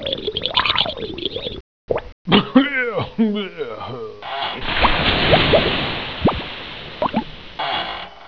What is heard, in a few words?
DISHWASHER